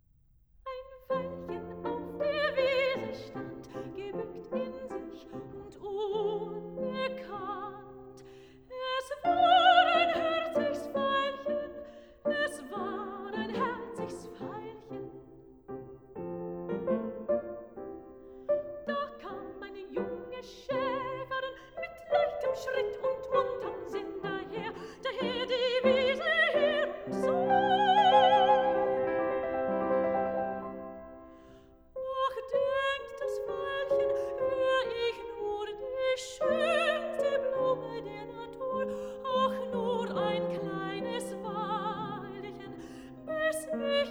song for voice & piano; Das Veilchen ("Ein Veilchen auf der Wiese stand"); Robert & Clara Schumann

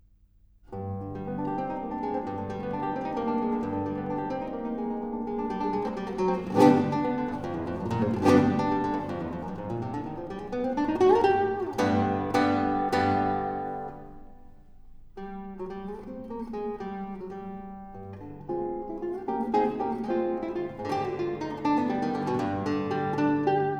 for guitar, Soleares

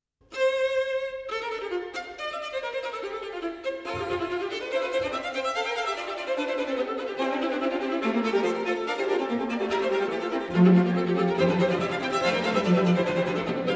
String Quartet in F major, Allegro; Mozart

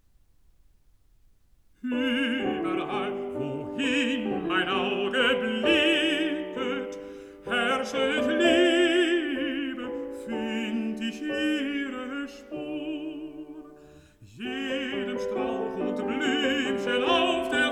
song for voice & piano

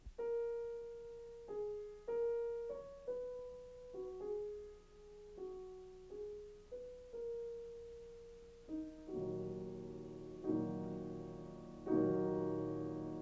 Preludes for piano, in E-Flat Minor; Scriabin

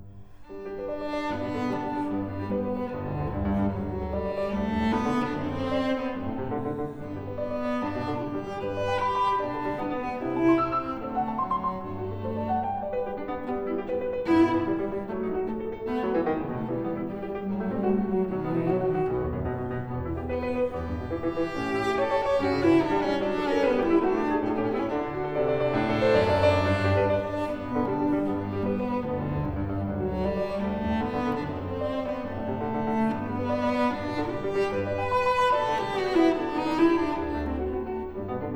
Variations for cello & piano in G major; on Handel's ＂See, the Conqu'ring Hero comes,＂ WoO 4; Beethoven

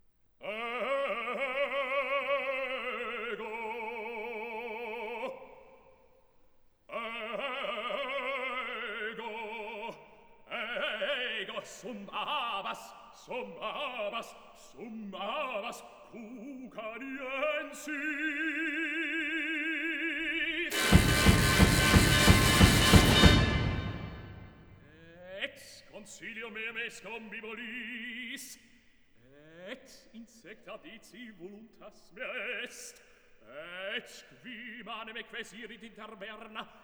scenic cantata for soloists, choruses & orchestra; Orff; Carmina Burana; Part 2． In Taberna

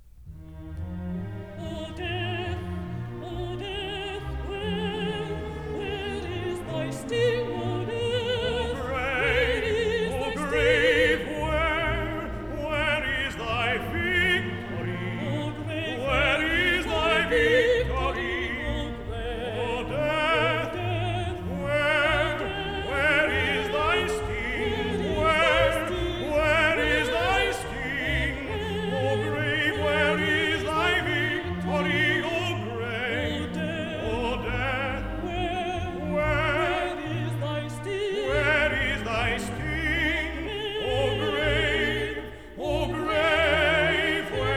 Contralto & Tenor; Duet; Messiah; O death, where is thy sting？

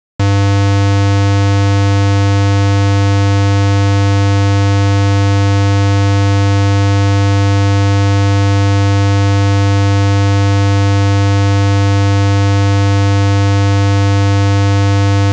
100hz Square Wave -10db L+r; Denon Audio Technical CD

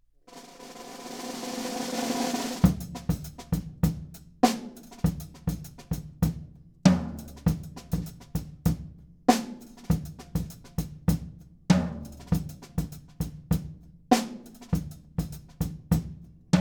Percussion Imaging Tests, Drum 9 Feet, Dry Studio

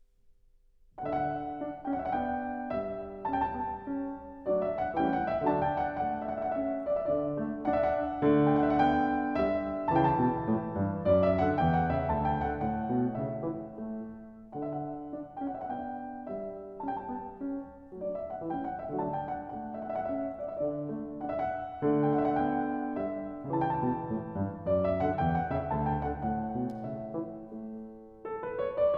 Partita for keyboard in D major, Menuett; BWV 828 (BC L4); Bach; Partitas Nos. 2, 3 & 4

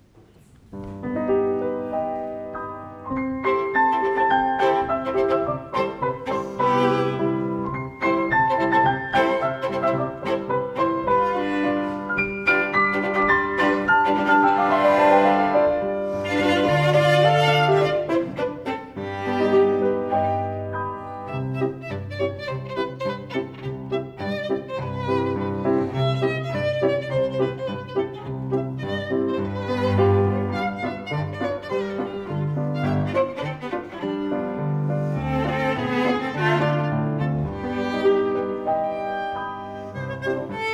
for piano trio; Flockenballett

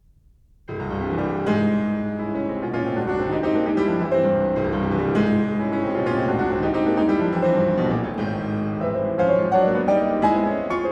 Preludes for piano, Prelude in C major; Rachmaninov; 24 Preludes

